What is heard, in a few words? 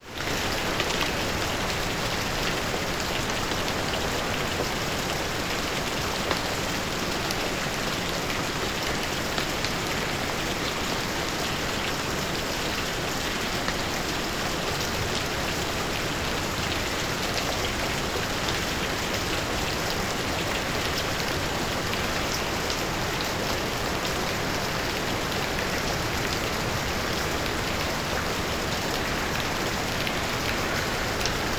Rain Sounds